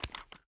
reload end finish, weapons; gmod; viper

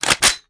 reload empty charge; viper

